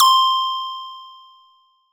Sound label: Perc